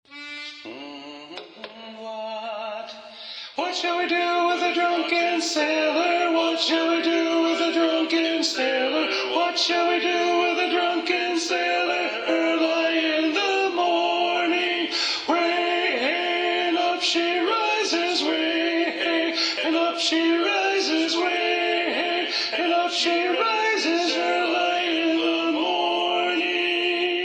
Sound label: Tenor Predominant, sea shanties; Drunken Sailor